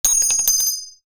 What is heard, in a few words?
cart